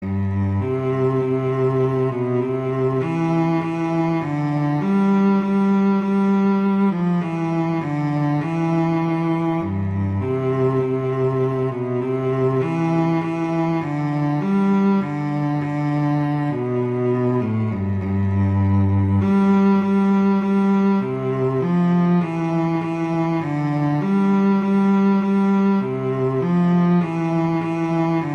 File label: Hark The Herald Angels Sing; Christmas Beginners